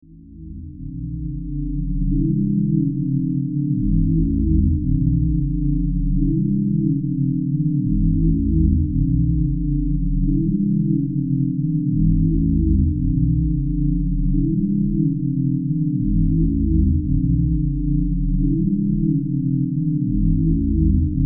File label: Drone